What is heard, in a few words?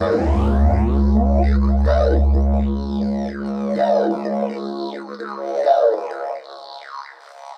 Tonal FX C#